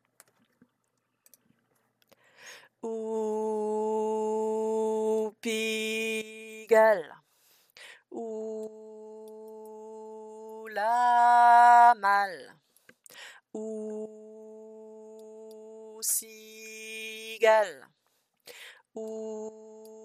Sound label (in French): basse; Piste basse